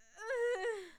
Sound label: pain strong; VIVAE